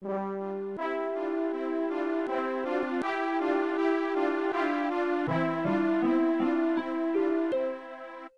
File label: Unknown jingle; Quest 64